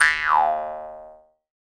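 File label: Jaw Harps, Pitched Percussion; E-MU Producer Series Vol. 7 – Old World Instruments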